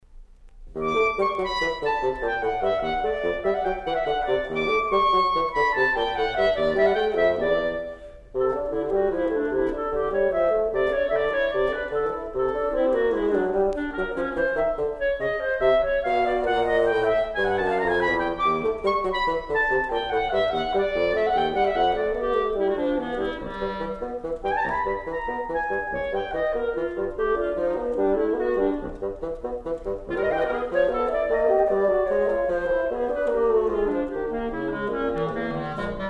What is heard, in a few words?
duo cl bsn